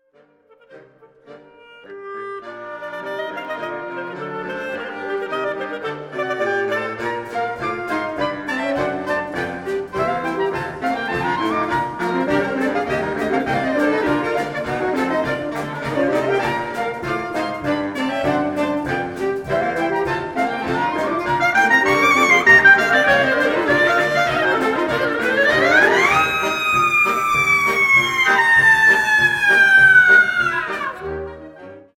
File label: Clarinet Gang